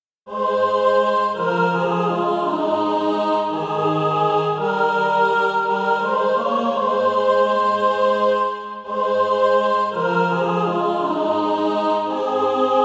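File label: tenor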